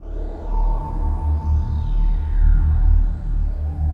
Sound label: Deep Wheel